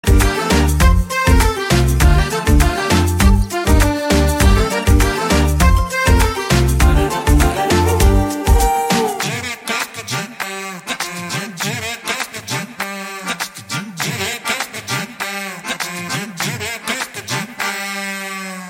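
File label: Love background music Tone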